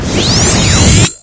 charging, weapons; Source Engine; video game; cguard

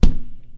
rubber tire impact soft

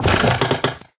plywood, footsteps

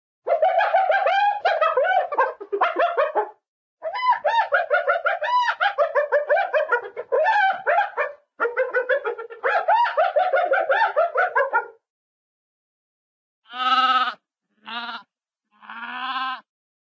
hyena hyena laughing, animal; cartoon

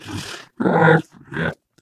ballbot meh

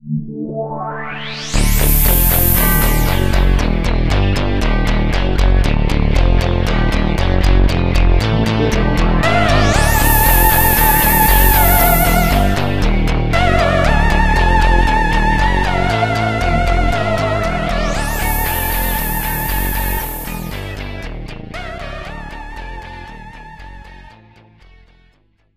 start mid wave, music; source engine; tf; mvm

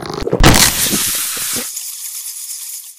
antlion burst; npc; source engine; ep2

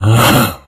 death, infected male; Left 4 Dead 2; Source Engine

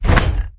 dog footstep walk; npc; source engine; episodic

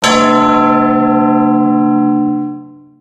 strongman bell; halloween; video games; source engine; tf

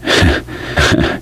laughter, gambler, survivor voice; source engine; left 4 dead 2

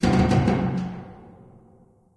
metal randoms, ambience; source engine; portal2

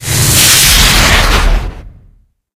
spell spawn boss disappear; halloween; video games; source engine; tf

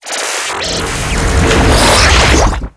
medi shield retract; tf

